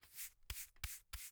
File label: Household Broom Sweeping Cement Floor